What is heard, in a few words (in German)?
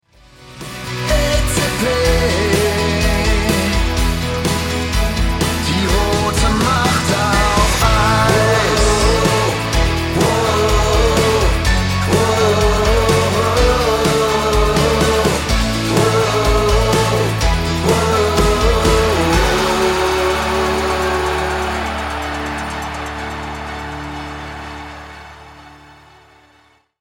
hymne